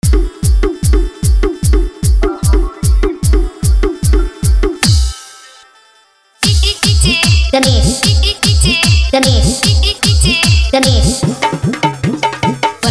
New Bhojpuri Dj Remix Song

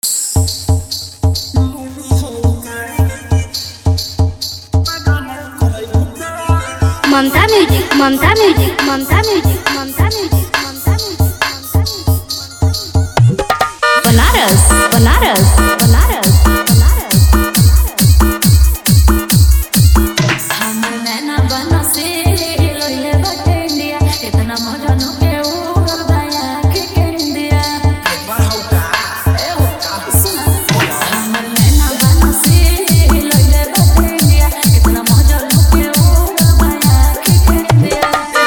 New Bhojpuri Dj Remix Song

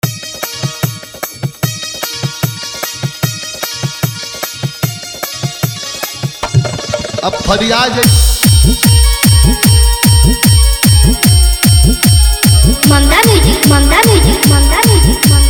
Trending Bhojpuri Dj Remix Song

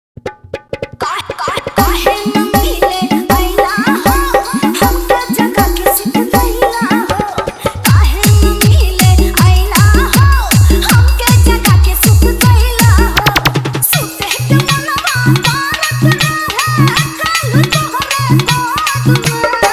Insta Viral Dj Song Hard Boom Bass Mix

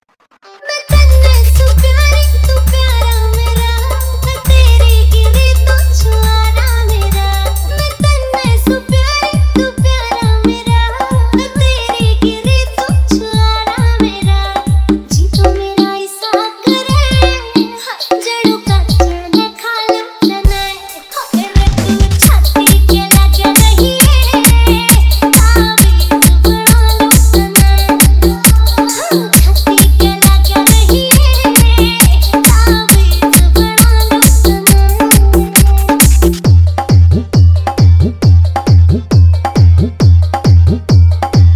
Hard Viberate Bass Full Quality Mix

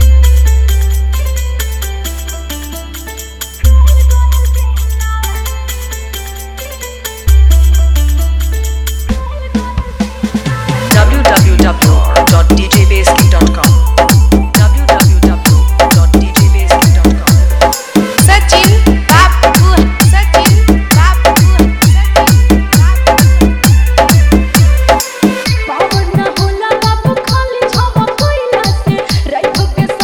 Hard Vibration Mixx

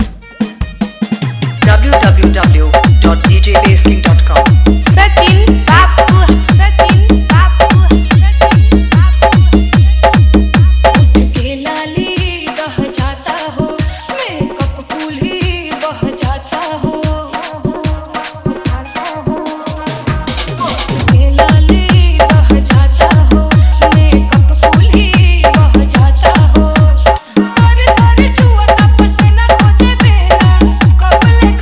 Hard Vibration Mix

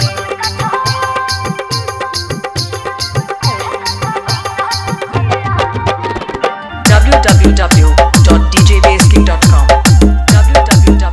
Hard Vibration Dholki Mixx

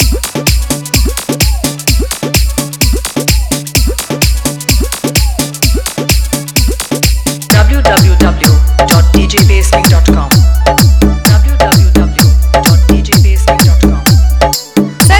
Hard Vibration Bass Mixx

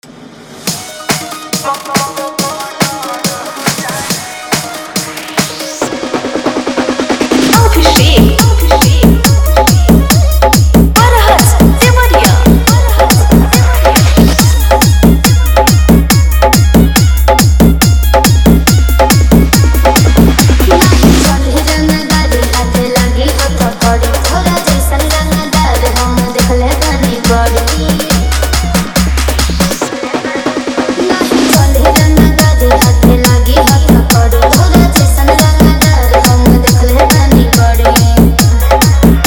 Full Vibration Hard Dholki Horn Mix

